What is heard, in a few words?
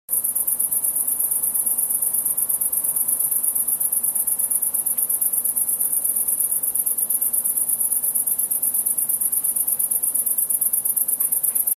tettigonia cantans